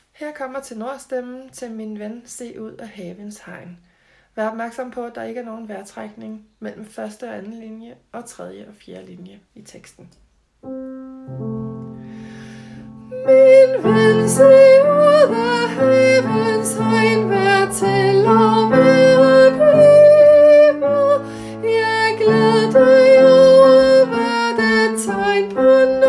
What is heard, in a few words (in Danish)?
tenor